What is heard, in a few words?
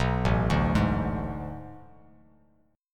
A Major 7th Suspended 2nd Suspended 4th A down Bass Standard; AM7sus2sus4 arpeggiated; JGuitar